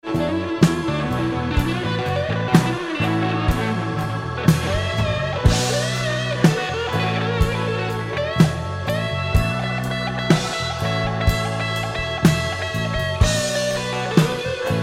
vocal

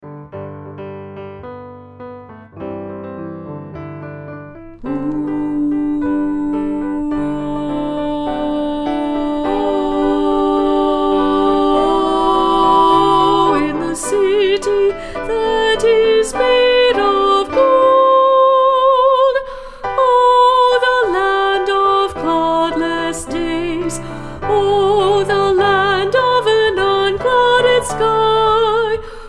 Alto 2; Unclouded Day

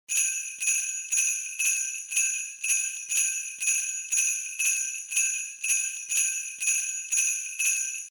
SLEIGH BELLS - DRY VINTAGE MIC LOOP

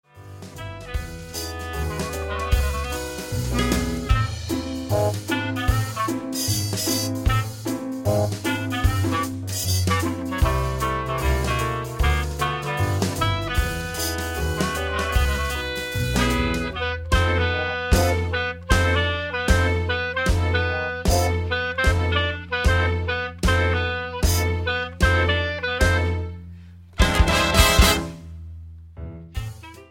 Swing

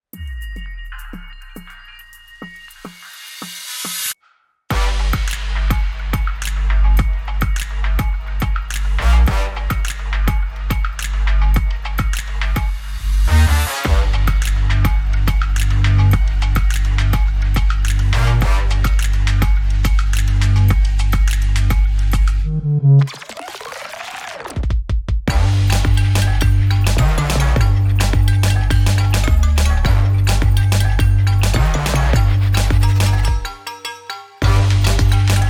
Electro Pop

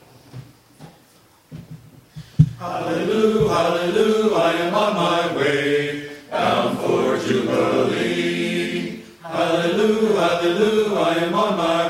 Men's Choir

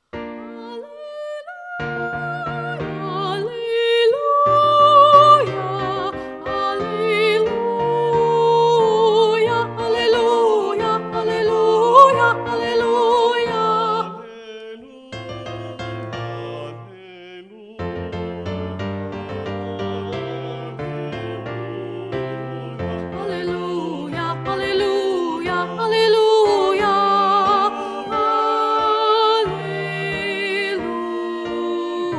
Maestoso Alto; Laudate Jehovam 3 Maestoso

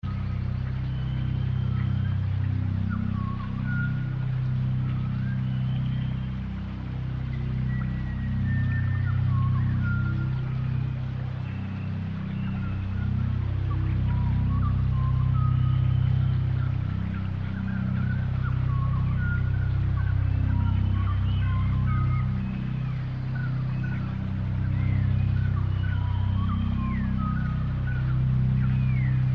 Rainforest, 32Hz

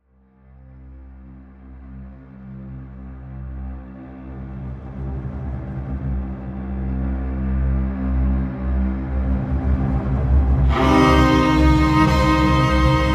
Jackdaw String Felt Texture

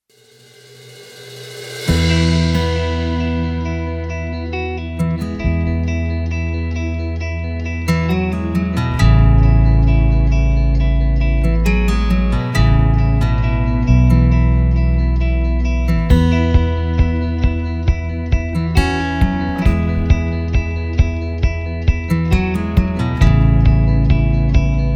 Chilled Ambient

